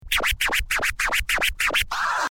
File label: baby